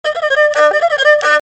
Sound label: Erhu